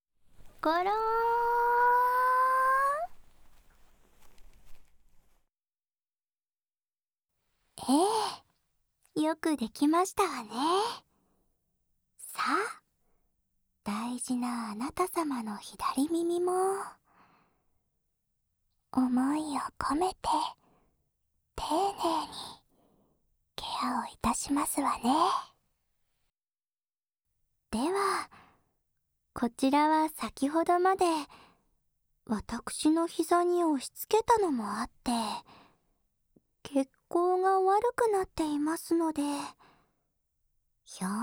梵天竹耳かきで耳を整えましょう（左）; いえカノ～大和的お嬢様彼女と満喫する和の一日～; 肩&首回りマッサージ

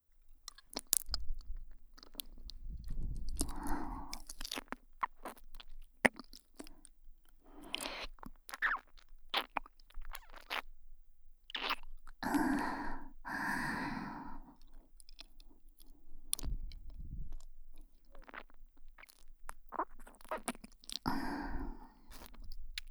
よちよち煽り耳舐めループトラック, 効果音なし, ASMR; リアルエッチ感; 北条櫻子はママになりたい～さくらママとのエッチな大人のバブ活保育園♪包容力MAX母性に甘やかされながら全肯定バブみ幸せ安眠あまトロえっち漬け～

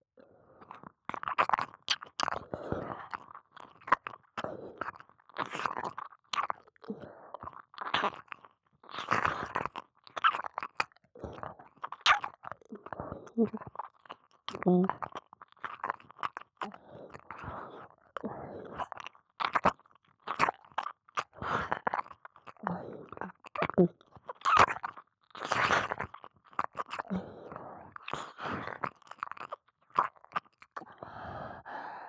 左 ぐぼぐぼ耳舐め; ネモナ

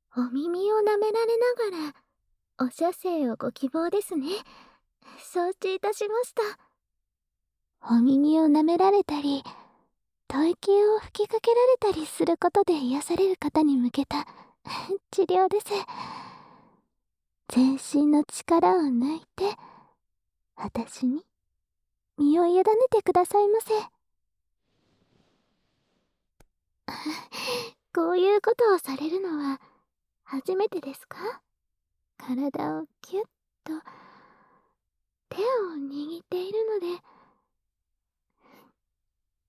耳舐め治療編

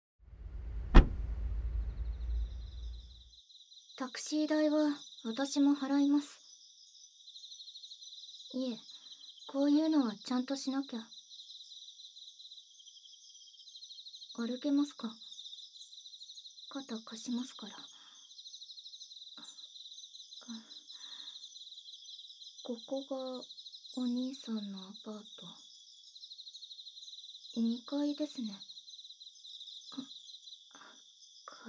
癒しのハグ（胸圧・心音）, ASMR

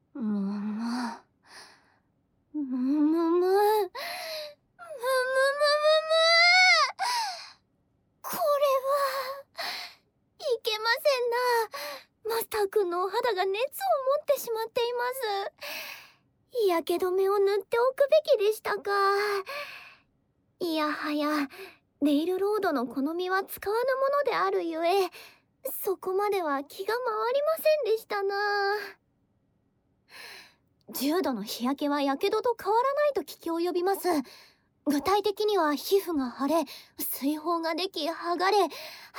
ガーゼでくるんだ氷を使い、耳～首を充填的にアイスマッサージ; 日焼け対処のアイシング; ASMR of Rail Works

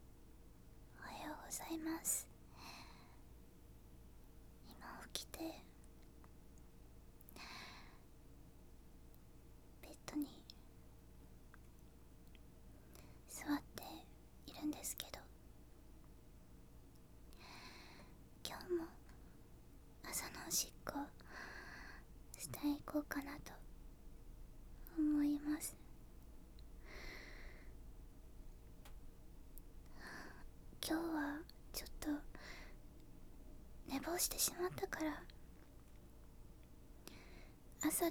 朝起きておしっこへ; 寝起きおしっこ

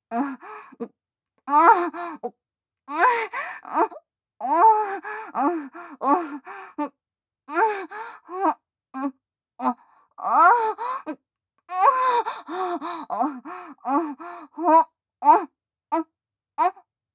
オホ声

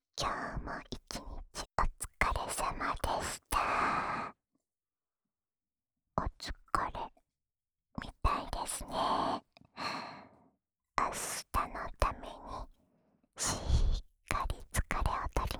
安眠用高級耳かき（右耳）, ASMR; 耳かきリフレ『春乃撫子』へようこそ♪～至極のロリ店長と、癒やされヌかされ温泉旅行。着床オプション付き!

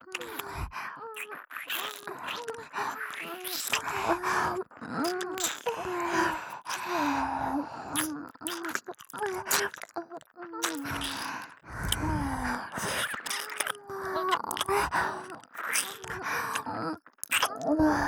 両耳舐め; 美冬

